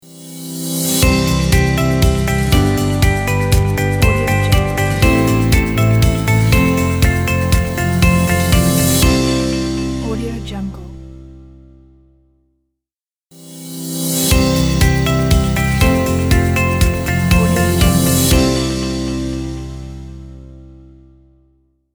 the corporate logo

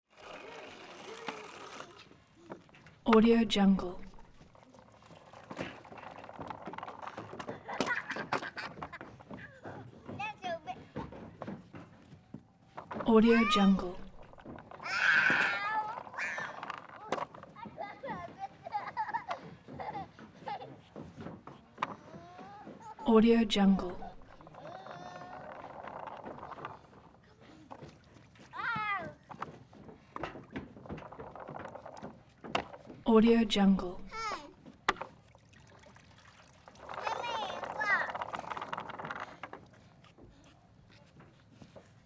child playing rolling toys splashing in water; prosoundfx

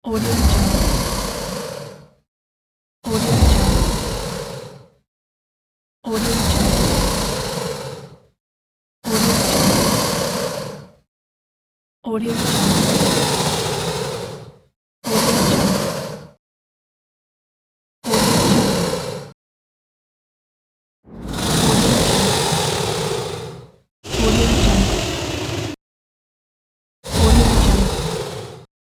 sea monster growl

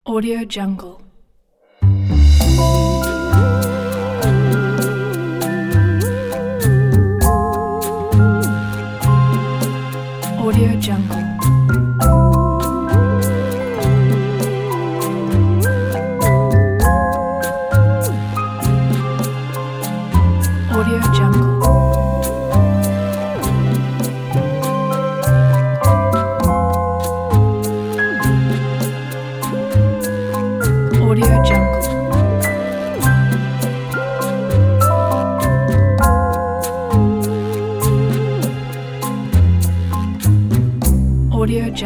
strangely romantic

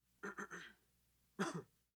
male coughing light intermittent

